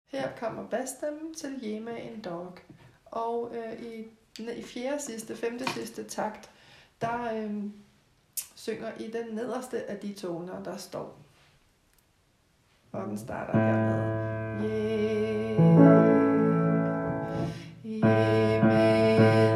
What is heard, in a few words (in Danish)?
bas